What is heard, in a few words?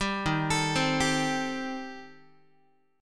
Eb 6th Flat 5th, Guitar; Eb6b5 arpeggiated; 10,11,12,10,11,x; JGuitar